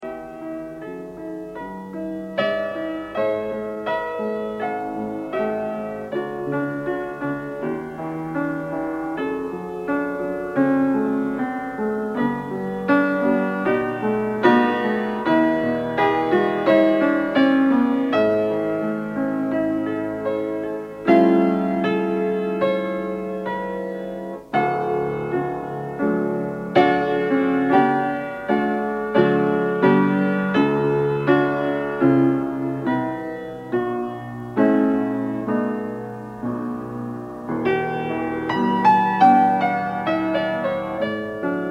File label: piano; Jérusalem